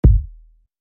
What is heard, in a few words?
KICK